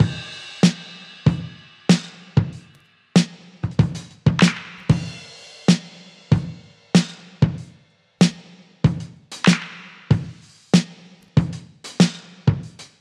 95 bpm drum beat e key, Free breakbeat - kick tuned to the E note. Loudest frequency: 933Hz